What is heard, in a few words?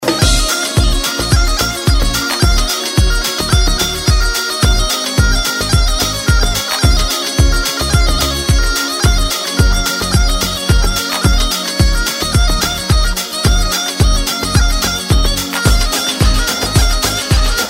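instrumental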